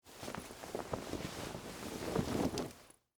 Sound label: Winter Coat Take Off, Clothes; Foley; Dressing; BlastWave